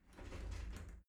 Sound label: shower slide door slide close